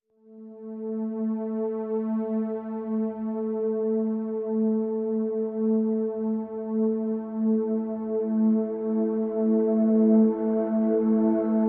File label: Pads Vapors (A)